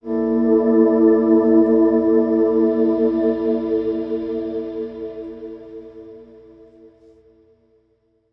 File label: Soft sting; mcsm music; General Stings